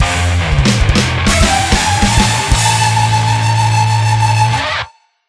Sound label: Challenge Victory, music